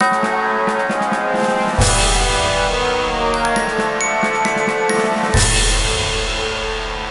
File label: Imperial Defeat; Lego Battles 2009 NDS